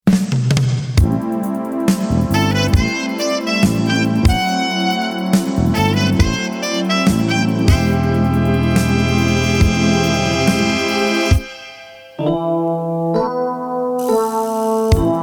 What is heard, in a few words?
Waves of music